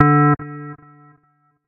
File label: Race Countdown Beep; pac man kart rally android